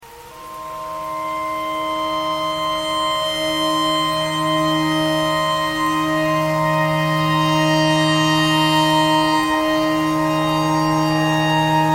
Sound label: clarinet notes e tornado siren thread pentatonic